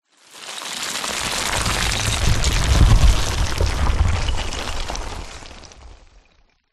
rocks stones small short landslide; nature

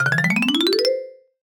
comedy marimba ascend; sound effects one

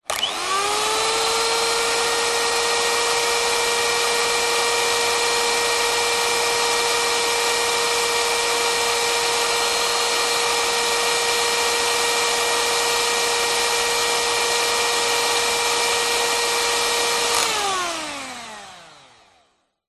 household electric whisk on run off; zapsplat

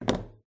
phone drops on wooden table; your free sounds

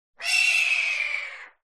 Bird Hawk Single Scre; audio hero

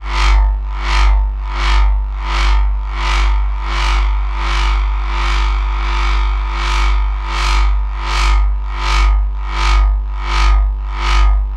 Computron Running; martyn stonehouse

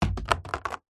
foley plastic tub lid drop on concrete; zapsplat

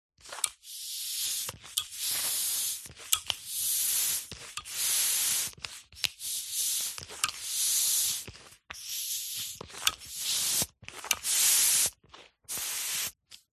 sport football pump hand pumping nozzle end; zapsplat

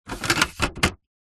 office printer paper tray plastic pull out

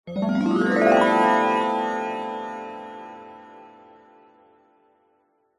magic glissando ascending bright warm twinkle

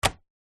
household window jalousie lock click

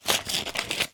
foley envelope letter open hands

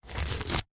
foley lable pull from cardboard

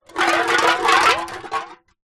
food drink can soda empty roll wood floor; zapsplat

